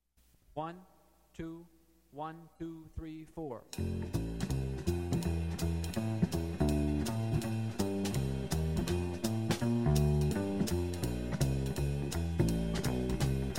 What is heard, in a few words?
bird blues no piano